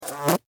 Fly, Land, Buzz, Short, Close, Wings, Insect, Bug; Flies; Animals:Insects; Blastwave

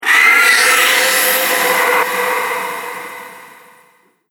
Scary Creatures, monster sound effects; Fantasy Sound Effect; Andrew Fleming; Twistedtracks

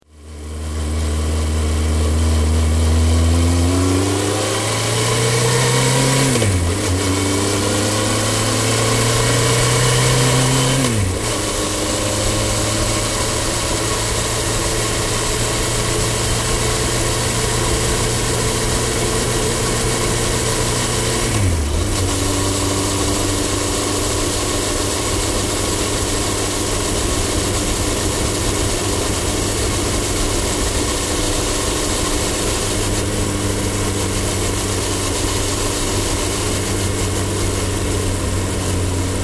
Motorbike Honda 1100 On Board Travel; Various speeds then slow to stop; Motorcycle; Tom Hutchings

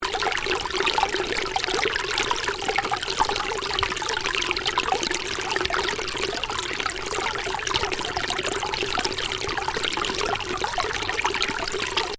Stream: small stream running, loop; Water; Nature; Stuart Duffield

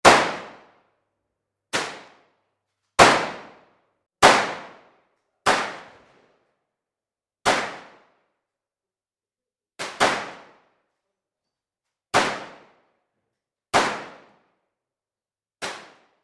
Gunshots Interior 1, Various pistol shots; Gun Shots (Sound Effects:Guns)

